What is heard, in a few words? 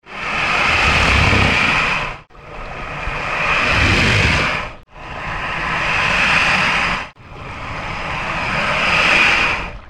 Charlie Warning Sound